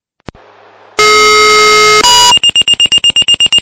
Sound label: PAGER TONE; NPFD